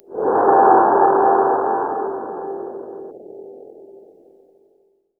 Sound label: C3, Synth Line; 90 s Sample CDs; NiceBeats; SynthLine VOL-32